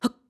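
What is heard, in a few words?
Climb, voice; Link; BOTW; Breath of the Wild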